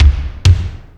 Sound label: Lotsa Kicks, Drum Machines